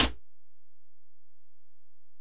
Hit, Closed Hats

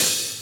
Closed Hats

